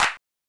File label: Oberheim DX